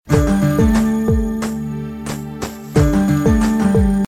Musical Msg

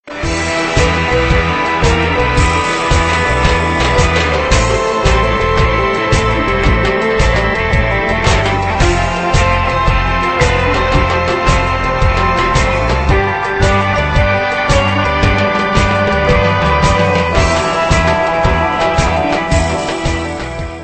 Techno; Dexter

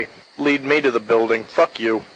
Lead Me

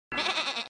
Baa

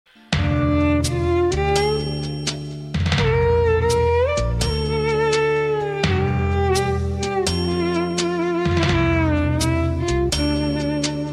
Instrument

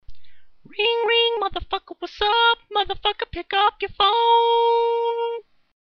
Ring Ring; Pickup

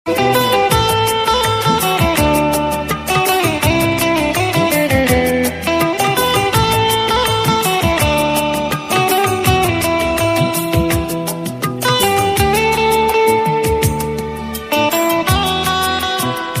Instrume

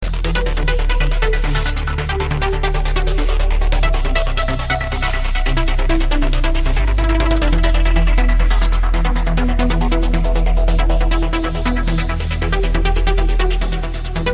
Techno Club Mixx

